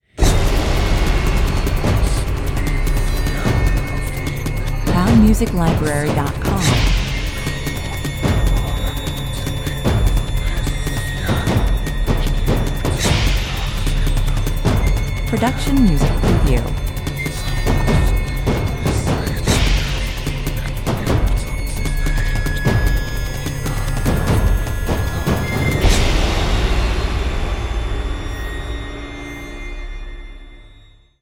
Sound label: Short trailer for suspense sequences or intro cutscene